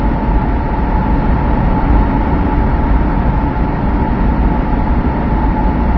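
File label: ambience machine; dm criticality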